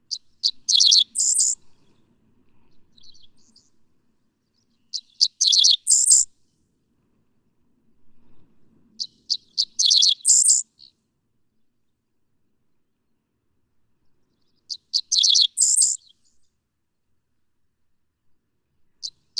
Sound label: Dickcissel, Missouri